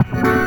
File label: B STAB, funky